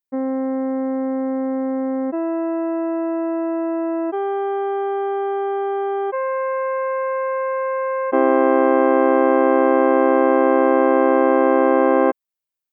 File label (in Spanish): septimas